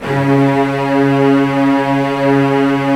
STR VCSF, Roland LA Composer; Roland - LA Composer vol. 4

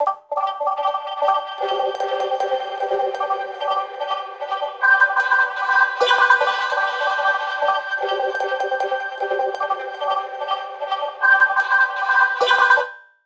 resample amb g; ai discord; M8; random and out of place

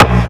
Bd Machine, Kick Drums; Zero-G; Soundclash

